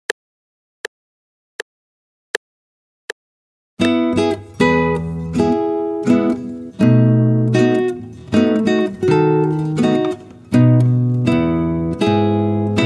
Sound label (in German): Landler Walzer